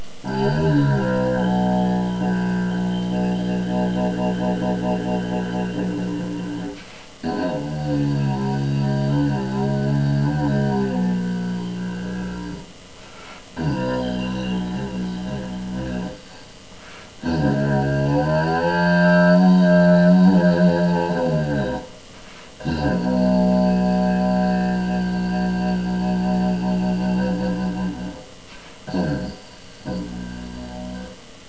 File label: pvc didge